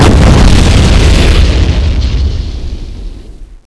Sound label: rpg rocket explode